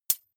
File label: midsize metal thin hit; industrie-wirtschaft; baugewerbe